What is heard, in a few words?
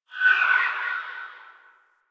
Gas City Distant Textures; Ambience; Warframe; Corpus Gas City